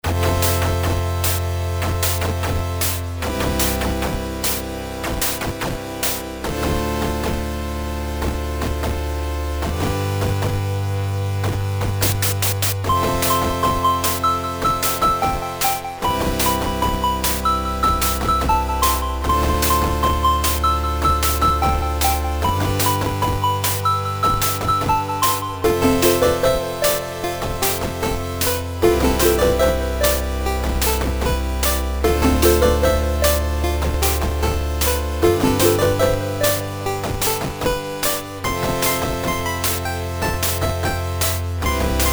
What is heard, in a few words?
town music; background musics